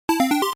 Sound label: bgm coin in; ユウラボ8bitサウンド工房; アーケードゲームのコイン投入音